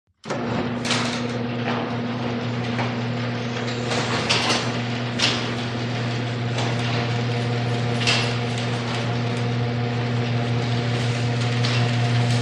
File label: Garage door, electric, close & open.; DOORS VARIOUS GARAGE DOORS; genie